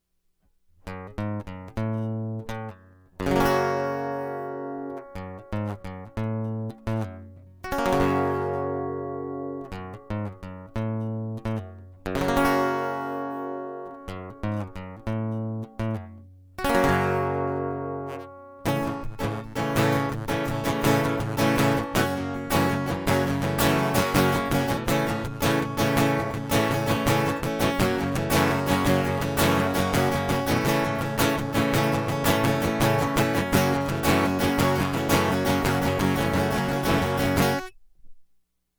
Guitar Prequel

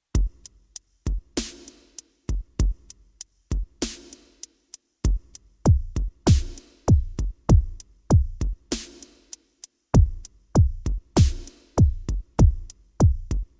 Cha Cha

